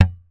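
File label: thumb, bass; Xsample VOL-1; SLAP+THUMB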